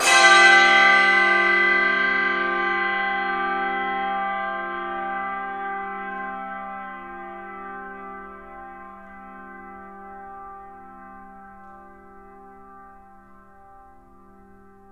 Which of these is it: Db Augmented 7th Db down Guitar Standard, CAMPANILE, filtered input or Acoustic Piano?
CAMPANILE